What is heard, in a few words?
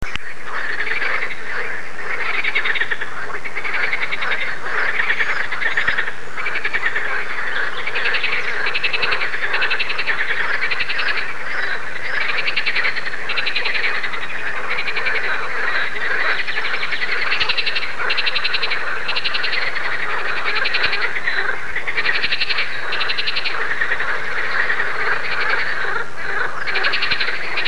ridibundus